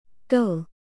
goal

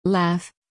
laugh

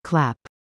Clap

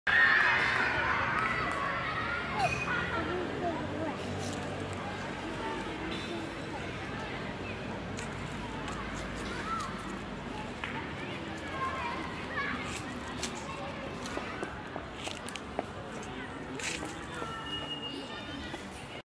Autumn sound

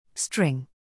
string

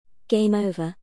Game over

